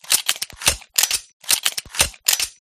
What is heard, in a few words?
reload, guns; resurviv